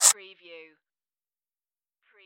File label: hover